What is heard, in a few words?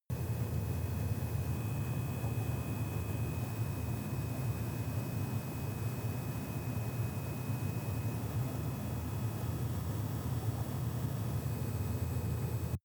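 sports car interior room tone; verkehr transport; autos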